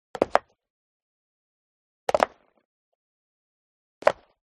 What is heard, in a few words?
FOLEY STEP High Heels Concrete Jump; smartsound